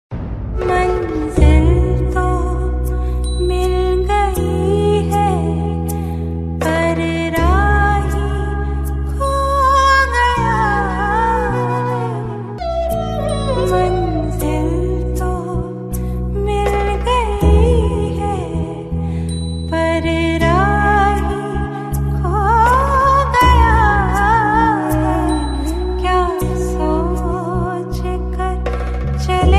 Title Song Sad; Tv Serials; Life OK; Sapnon Ke Bhanwar Mein

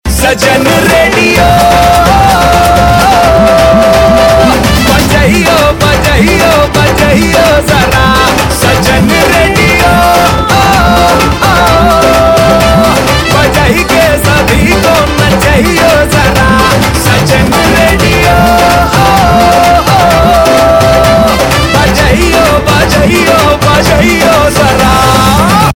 Ringtone; Bollywood; Tubelight

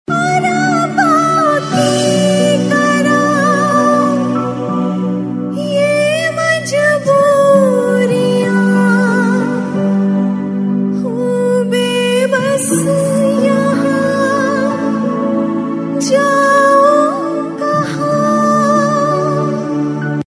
Sad Version; TV Serial Tone; Sony; Hum Hain Na

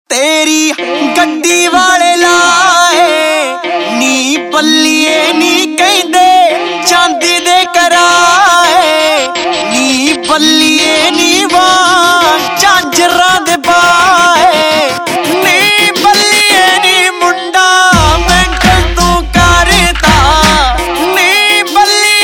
Whistle Ringtone, Punjabi

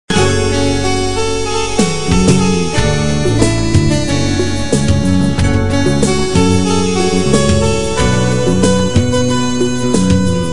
Instrumental

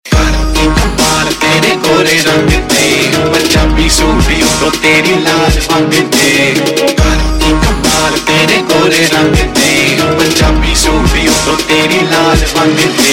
Ringtone, Punjabi

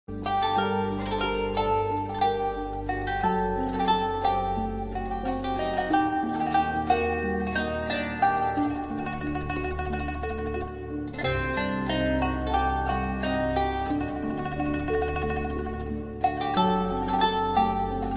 Best Romantic Tone

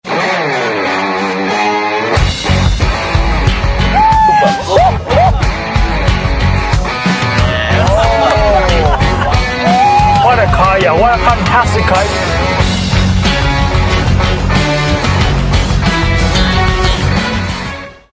TV ad guitar music; Renault Duster

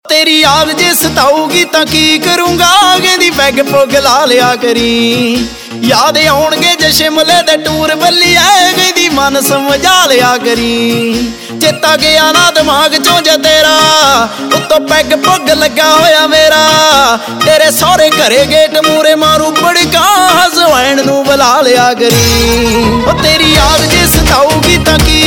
Ringtone, Punjabi Pop

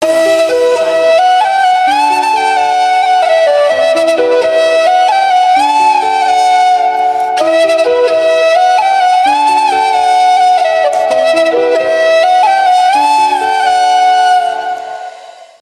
Instrumental Ringtone

